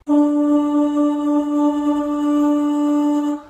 美声-D5

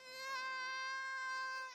哭; 赛马娘

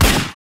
Kick 枪; 特朗普

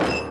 拍枪 Snare; 刘醒

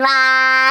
哇, C4; 音MAD; 乃爱

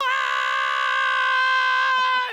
啊啊啊啊, C5; 坠子书; 德云社

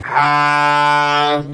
哈 D5; 哈比下; 电棍

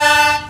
手风琴 Raw Accordion; 特朗普

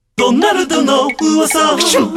片头; 音MAD; 蓝蓝路

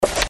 翻书; 音MAD; 蓝蓝路

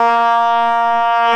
哈哈哈哈, A#5; 穿山甲

